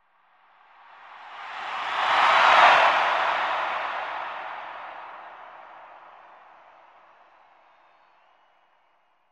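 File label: Arena Crowd Cheer; sports arena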